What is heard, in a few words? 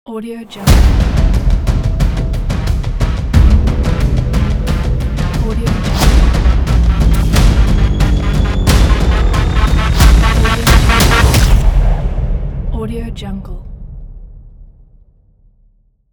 need blood ident